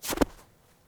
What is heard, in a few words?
footsteps snow